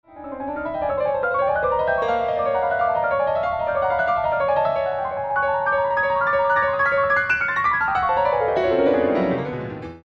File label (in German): Hummelflug